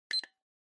Stopwatch Digital Mode

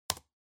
PC-Keyboard (Enter-Hard)

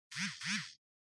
Cell Phone Vibration (Hand)

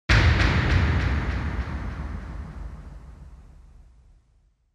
Onoma-Impact (Low-Delay)

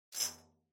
Tambourine Noise

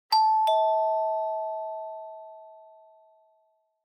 Doorbell Fake

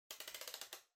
Electric Fan Far Height

